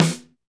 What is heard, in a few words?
SD Real, Roland; Sound & Vision - Gigapack I